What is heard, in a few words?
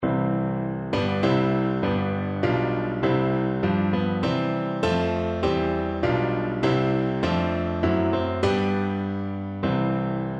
piano